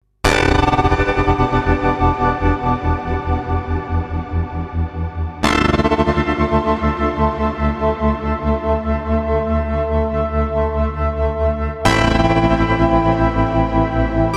unstable ringmodulator, yamaha cs 80 analog synthesizer